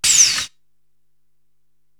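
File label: HH Alien Scream, hi hat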